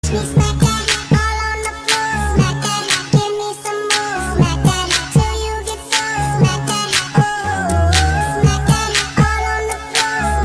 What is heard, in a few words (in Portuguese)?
Esquilos Cantando; Alvin e os Esquilos